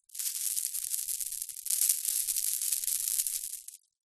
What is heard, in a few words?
household necklace plastic pearl shake rattle